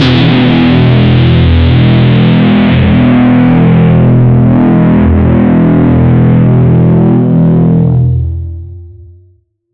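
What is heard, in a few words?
score point heavily distorted; sound effect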